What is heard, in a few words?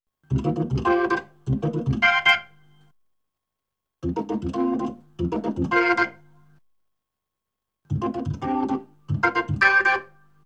B3 Organ; Masterbits CLIMAX 8